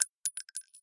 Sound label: weapons bullet casing; FMOD; Bullet Casings